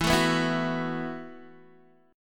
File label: E Suspended 2nd Suspended 4th E, down, Guitar, DADGAD